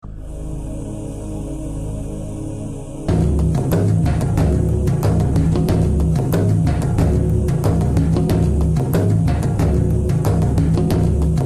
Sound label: Indian Spirit Music